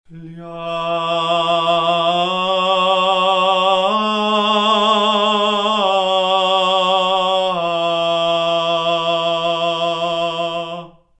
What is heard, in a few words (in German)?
tonfolge